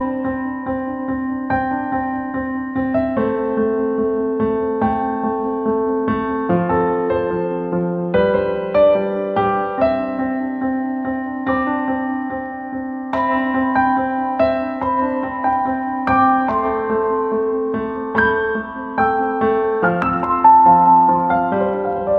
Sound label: Samsung Galaxy J2 Core Tchaikovsky Official Stock Ringtone